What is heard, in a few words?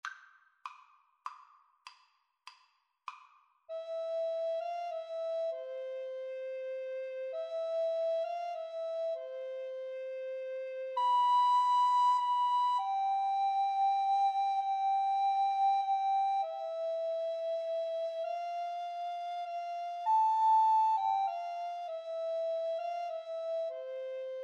silent night, Recorder Duet